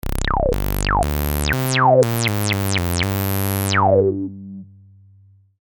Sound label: Model 12 12 dB HighQ